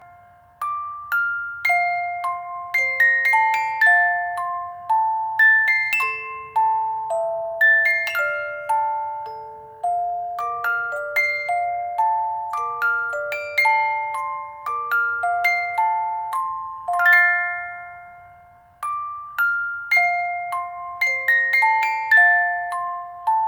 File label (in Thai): กล่องดนตรี